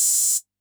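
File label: hi-hat open, Yamaha DD-65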